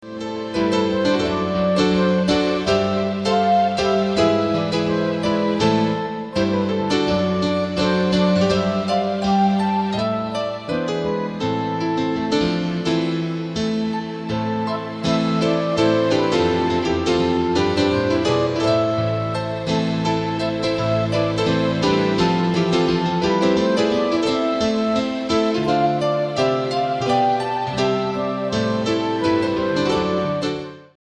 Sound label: Instrumental God Bless Ye Merry Families; Christmas